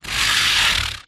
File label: sport plastic sports cone short scrape on concrete vibrate shake; zapsplat